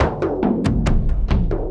Unka Doog Ah Boonka Boonka, Drums, Frame, Kendang, Short; Sonic Foundry (Sony Creative Software); Friendly Fire